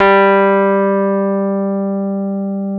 G 1, Electric Pianos; Wizoo Electric Pianos

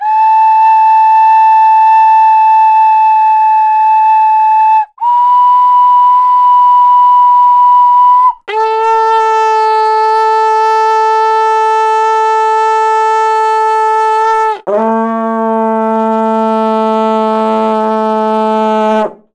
Winds

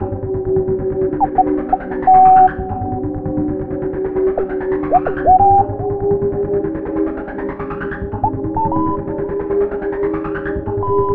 Melody, Hip-Hop

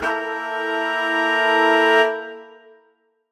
Horn Swell Eminb5; undercover samples

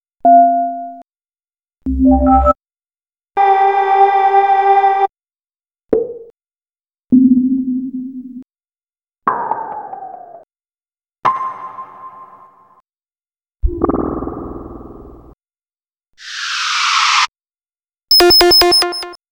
Ambient; Demo Samples from Ambient; Time + Space - What's Next!

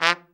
BRA TRUMP, trumpet; Best Service Gigapack 1

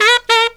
SAX

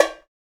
Bongos; Spectrasonics; Supreme Beats; World Dance

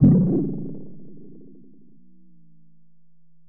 FX D min; random and out of place

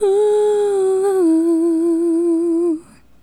Adlib, Hip-Hop and RnB Vocals; Sonic Foundry (Sony Creative Software)

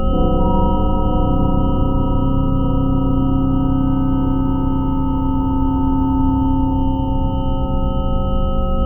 FM MOOD; Bolder Sounds - Granular; X-FILE

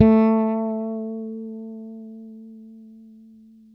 BRITE FRETLS A 4, Bass; Spectrasonics; Bass Legends CD 1

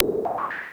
MOOG HAT; AMG - Vince Clarke Lucky Bastard

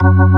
ORG VK, B-3 ORGANS Vinta Keys B3

